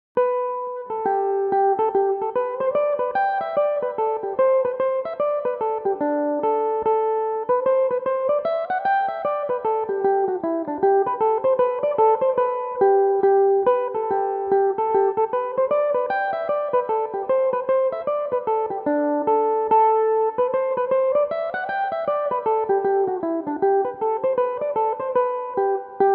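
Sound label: hornpipe g major, mandolin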